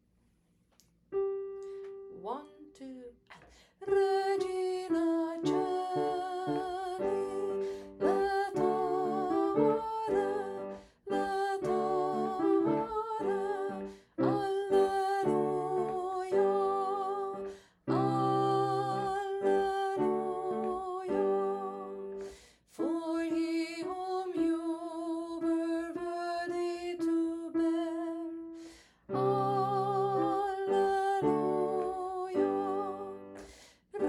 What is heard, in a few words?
Alto Regina Caeli, choir; OLL choir